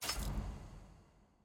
scroll click

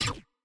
ui abilities locked

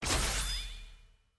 button confirm click; tft sf2023

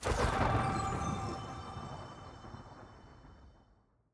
perks lockin secondary

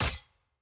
skill hit; skill 1; gwen

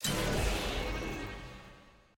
click; skin shaco

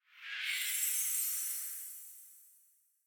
honor checkpoints dissolve

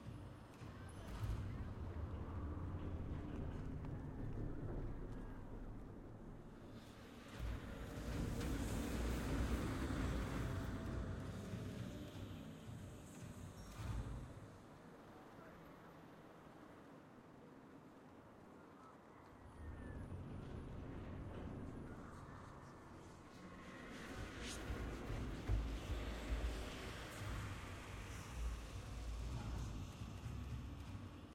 amb streets; piltoverzaun piltover

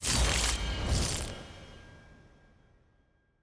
sfx roll rewards appear anim; exalted chase 10x

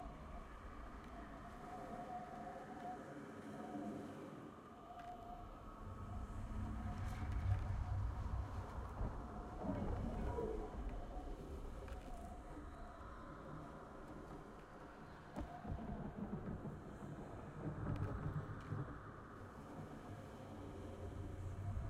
amb freljord tundra

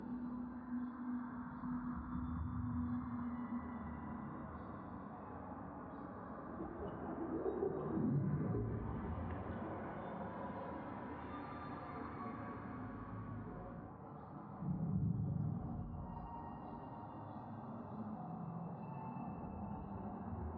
amb

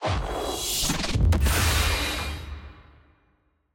ui gameplay blue flash

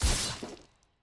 aah; shaco

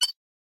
ui champ select countdown 10; jade

